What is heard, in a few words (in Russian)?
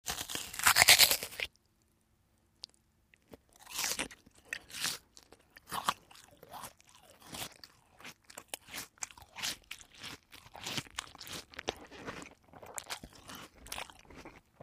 kusanie jabloka i chavkane